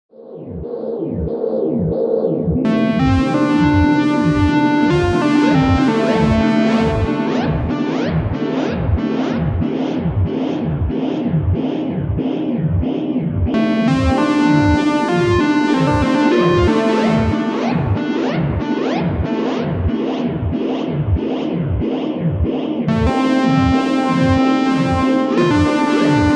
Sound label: Strymon Magneto Tape Control w Step Sequencer